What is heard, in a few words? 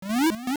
Swim; Link; Oracle